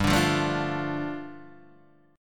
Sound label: G 7th Suspended 2nd G, Guitar Open D; 5,5,3,3,5,3; down